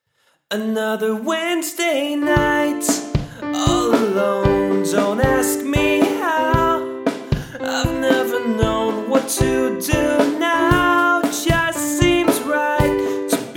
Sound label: pop vocals